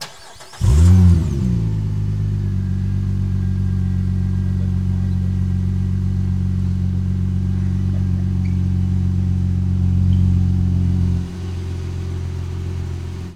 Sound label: Prowler Start Idle, Automobiles